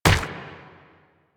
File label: Proto Infested Runner Step Default